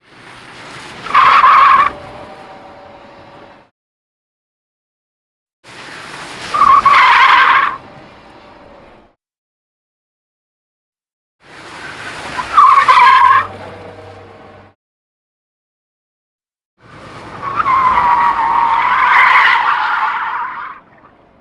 skids tarmac exterior skidding wet tires handheld; Sonniss GDC

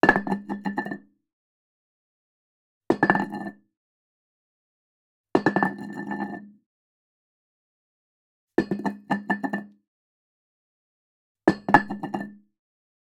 weight metal plate 10kg dropped; fvtt sonniss gdc audio

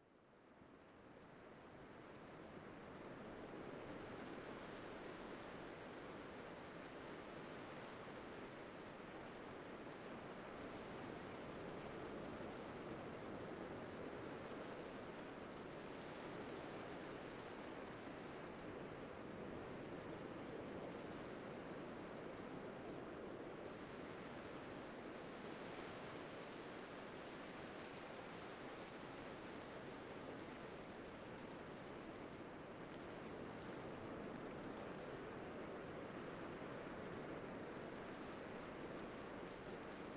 cromer beach in stones light waves; b-format; fvtt sonniss gdc audio

